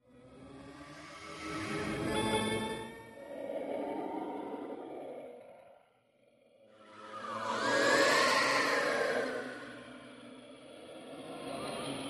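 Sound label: Horror Scifi Background; horn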